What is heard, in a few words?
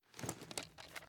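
ar akilo47 drum reload empty fast movement; weapons; viper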